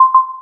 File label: alert; vellum